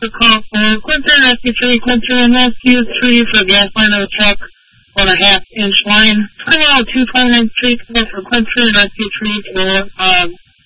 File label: Fire